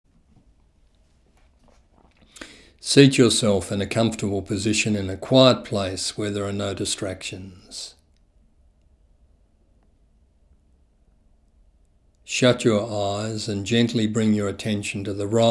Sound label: rhythmic breathing one word